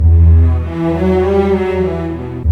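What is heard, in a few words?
100, orchestra arpeggi; East West; Scoring Tools; Myst Arpeggi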